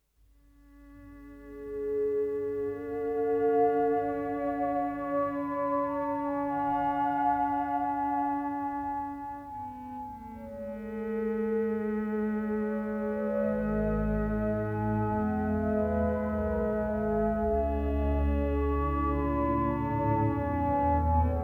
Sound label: Concert for Saxophone and Orchestra